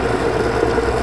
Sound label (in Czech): pumpa; Mafie 1